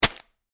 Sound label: Foley Bag Plastic Drop Full Heavy; Drops; Objects; SFX Bible